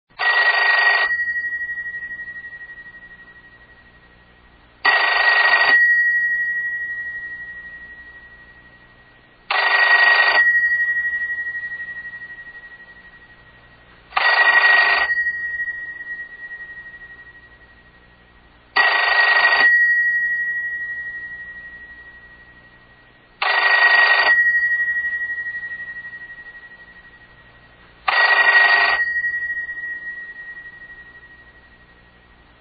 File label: telephone rings; sound effects and sound reinforcement